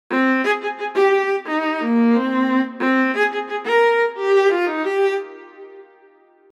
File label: Keyswitch